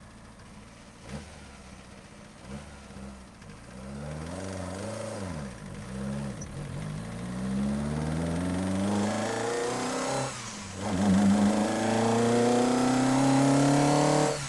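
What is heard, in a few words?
ford escort rs1800 mkii onboard medium drive various engine left; dpa4061; Sonniss GDC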